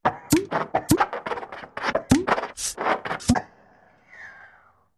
Funny Reverberant E, mechanical

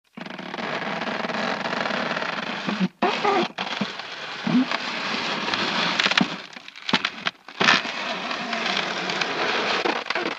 Ice Cracking Rubbing

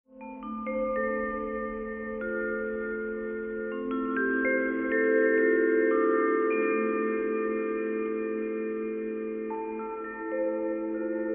Vibraphone Come Into; mallets

